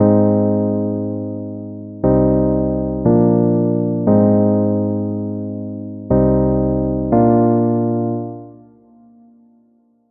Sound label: C Minor Prog